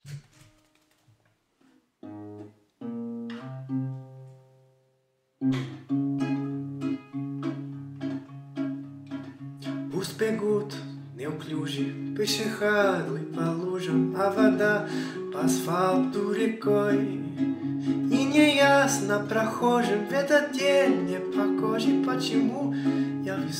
happy birthday russian

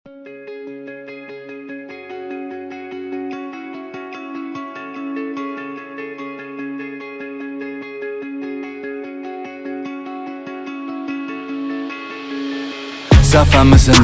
remix rap farsi

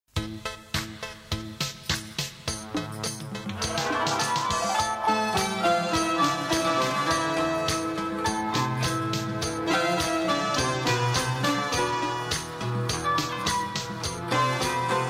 instrumental music turkish without word happy

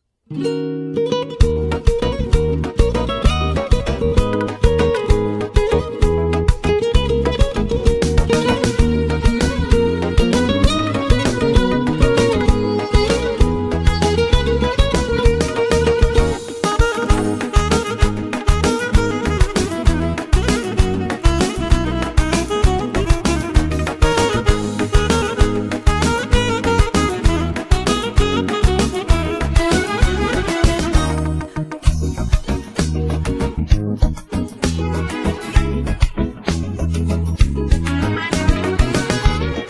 instrumental

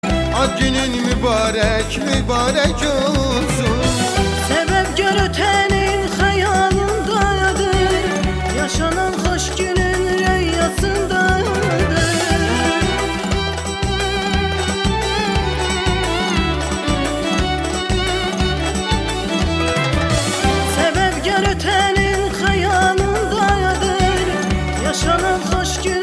Turkish Birthday

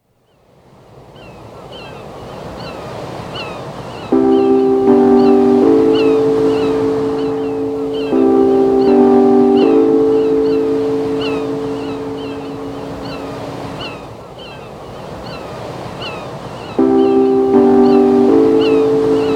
relaxing music

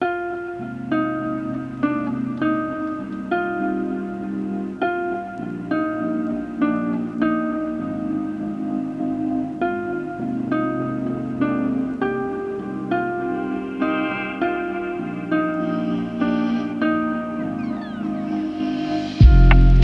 lofi

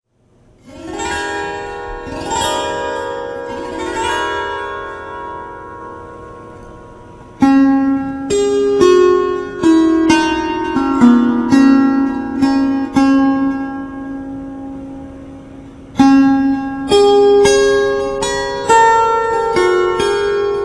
music harp

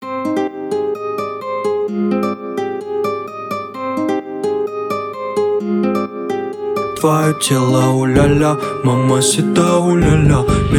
beats

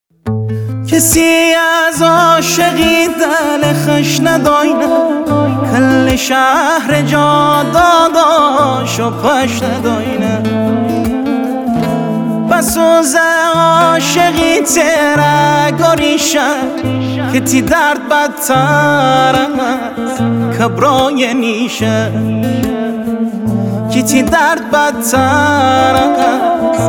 mazandarani ba gitar remix

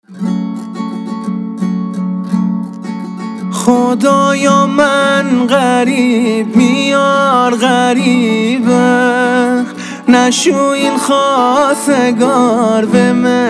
mazandarani ba gitar

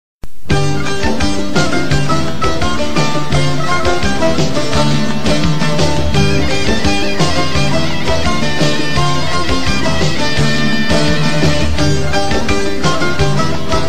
music torki folkelor

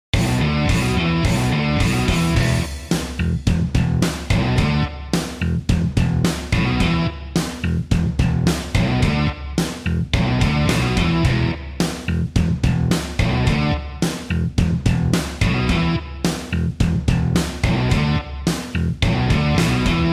music bikalam gitar

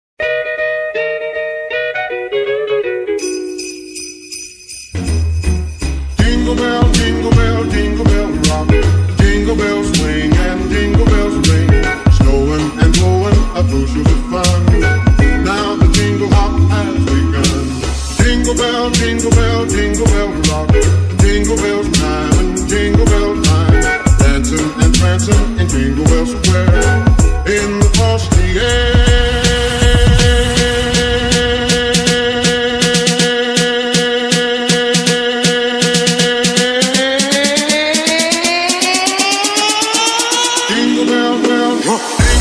jingle bells

